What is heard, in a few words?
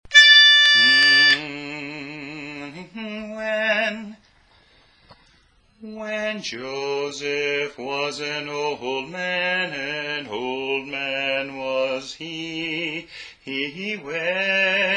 Cherry Tree Carol (Bass)